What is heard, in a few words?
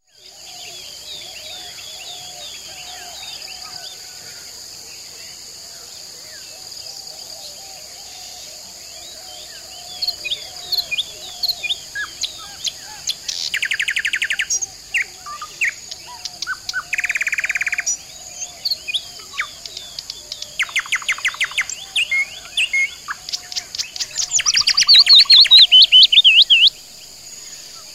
Birds Relaxing